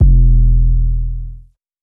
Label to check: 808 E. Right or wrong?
Right